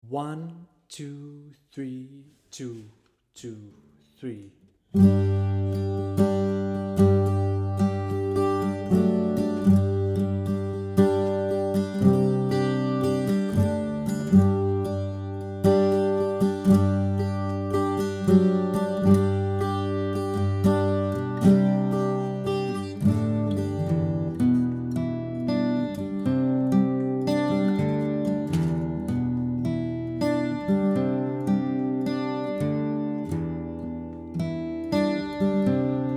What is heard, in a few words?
Guitar Steady